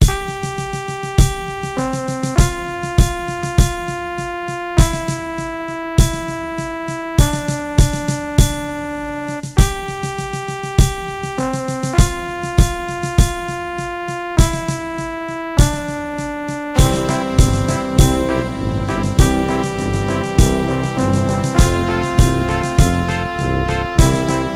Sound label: Victory March